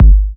Kicks Electro

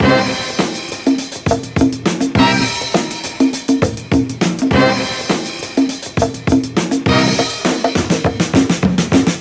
bursts, Drum loops Funky

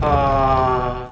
ah male let down; Voice; ooh ahh

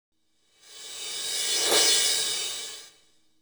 reverse cymbal; Misc Percussion

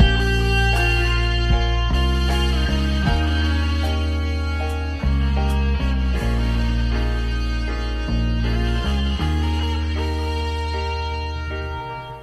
guitar, Loops

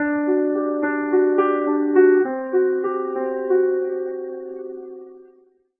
piano slowdown, sad loop; Loops

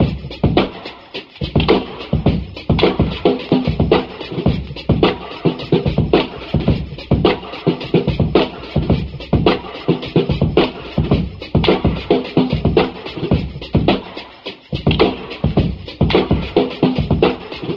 too long to clap along, Funky Drum loops

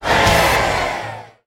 yay crowd; Voice

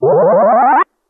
powers go up, Zaps and Beeps

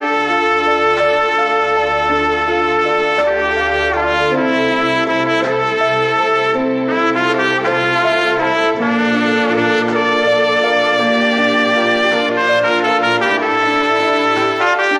horns, Loops

